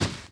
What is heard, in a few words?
Dog Hit Ground; Playable Tutorial Level